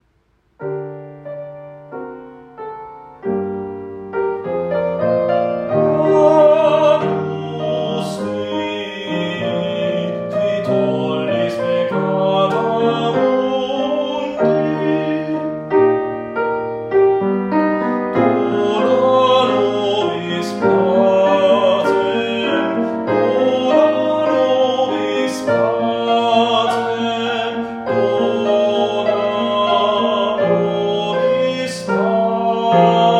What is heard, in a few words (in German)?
Sopran Agnus Dei